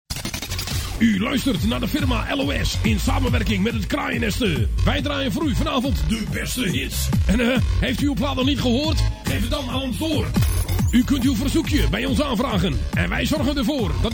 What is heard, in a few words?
Jingle; Audio Fragmenten